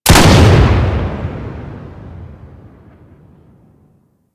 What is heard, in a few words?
Gunblade Shot 3D, Weapons